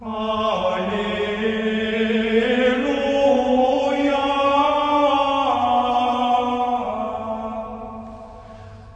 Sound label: gregorian, MALE SINGING